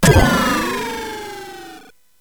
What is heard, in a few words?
Jingles, CSG8580R5